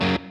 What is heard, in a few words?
Staccato F#m